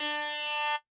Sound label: kawai k1 PIANO; wavetables